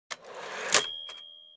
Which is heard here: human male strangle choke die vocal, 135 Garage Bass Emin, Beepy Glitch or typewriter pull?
typewriter pull